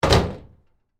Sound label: Cabinet Door Close, Metal, Large; Foley; Cabinet Doors; BlastWave